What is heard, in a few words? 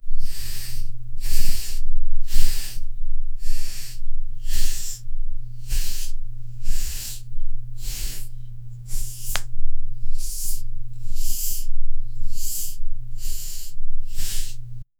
realistic asmr